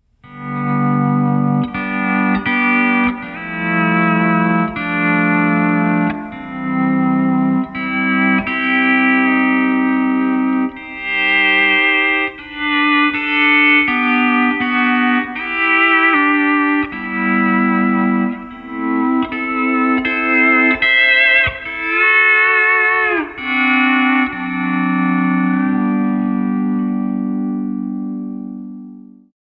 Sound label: Pedal Steel; GFI Ultra; se electronics gemini ii